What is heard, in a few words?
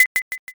mahjong discard